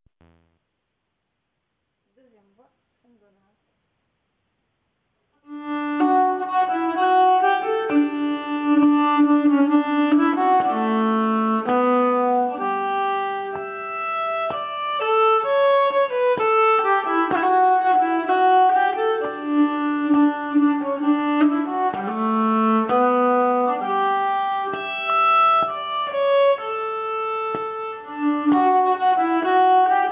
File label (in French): Polska Seconde, nyckelharpa